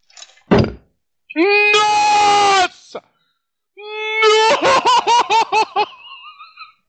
headshot arco e flecha; denis

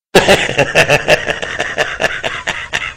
risada de velho

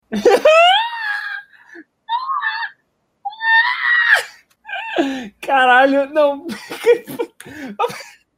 risada histerica

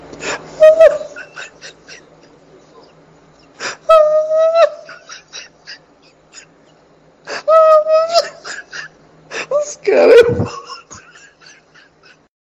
risada os cara

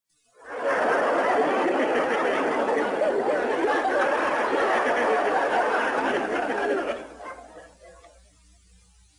sons de fundo risadas; chapolin

